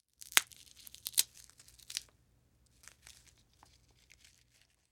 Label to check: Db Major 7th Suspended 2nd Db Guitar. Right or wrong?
Wrong